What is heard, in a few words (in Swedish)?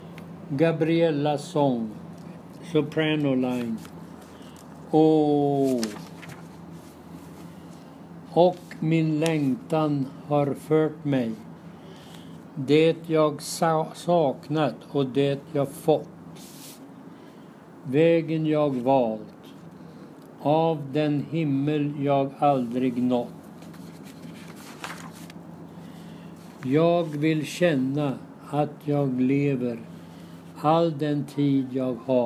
Soprano